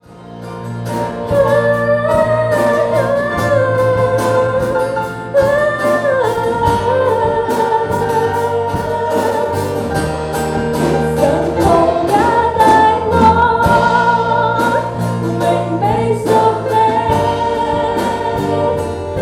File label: Worship, Cantonese